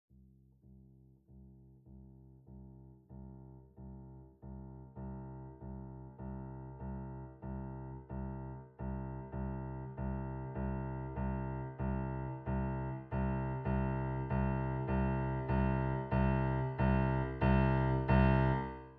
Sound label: vsl fazioli test